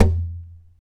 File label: TAP, FLAMENCO Guitars